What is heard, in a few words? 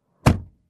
Closing Car Door